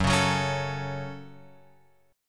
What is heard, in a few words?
F sharp Minor F sharp, Guitar Open F; strummed; JGuitar